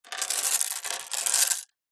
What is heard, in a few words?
foley gemstones jewels many scoop up with hands from wooden surface